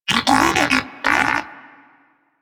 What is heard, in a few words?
Greeting, Moa Pet Tenacious; Warframe; Pets